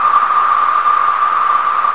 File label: ear noises; animal